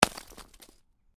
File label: Ice: medium chunk of ice lands on hard surface; Snow; Stuart Duffield